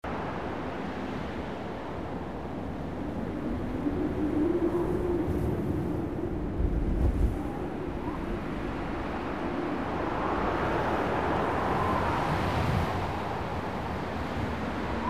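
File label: WIND Summit Gusts Soft loop; gamerip; The Unfinished Swan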